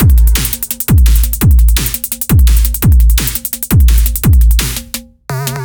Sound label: Microdrums, 170 bpm